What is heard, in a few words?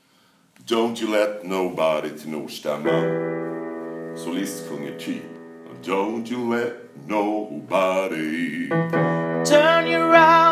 Tenor; Gospel